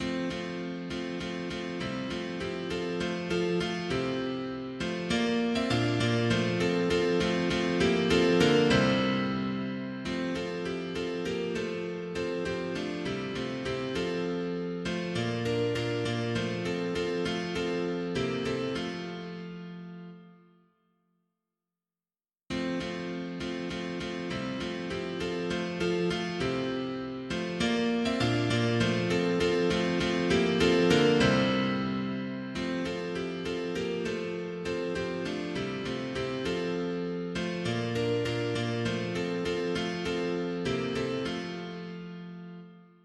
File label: mono piano; hymns; Himnario grande